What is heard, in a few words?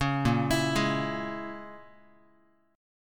Bb Minor 7th Flat 5th, Guitar; Bbm7b5 arpeggiated